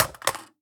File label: phys mag drop ak poly concrete, magazine drops